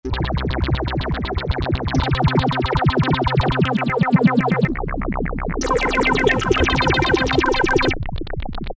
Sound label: sfx-LFOrbit; E-mu Orbit V2; Factory presets